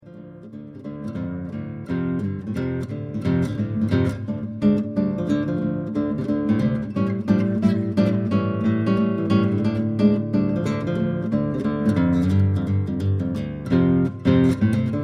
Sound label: Freeway Blues